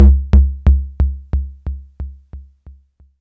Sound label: kick, Korg ER-1